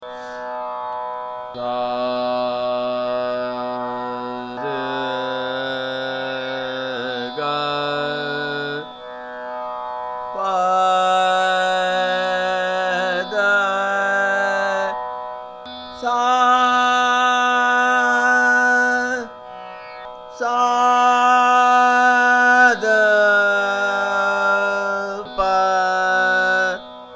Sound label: Bhoopal Todi Aaroh Avroh